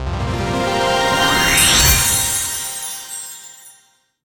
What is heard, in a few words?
pickme selected; vegas night life